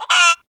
Chicken; Ed Edd n Eddy; Adromulus Pack; EEnE

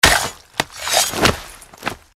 Sword Attack

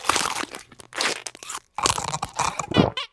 Eating - fish sticks; Double D; Ed Edd n Eddy; Adromulus Pack; EEnE

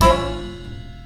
Band - Hit oh; Ed Edd n Eddy; Adromulus Pack

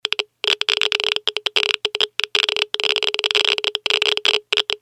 Geiger Counter High

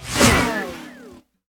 Whoosh running by; Ed Edd n Eddy; Adromulus Pack; EEnE

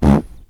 Fart

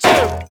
Impact; Ed Edd n Eddy; Adromulus Pack; EEnE

